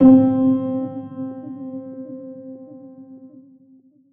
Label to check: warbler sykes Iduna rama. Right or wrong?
Wrong